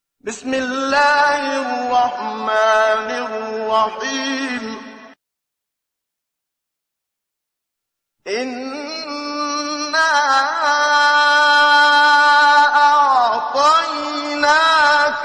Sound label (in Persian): تلاوت سوره مبارکه; کوثر; استاد منشاوی